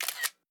photo Shutter, iOS UISounds; iOS10

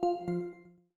Speech Off; Windows; Glass

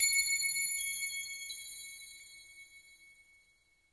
dialog question; Ubuntu; Linux

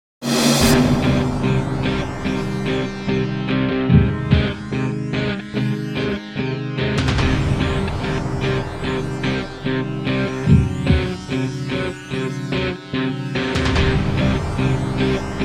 Money Tree Guitar; Millionaire Soundtrack

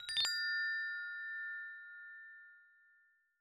Completion Haptic; i OS; iOS10; nano

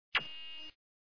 Open, Windows; Whistler

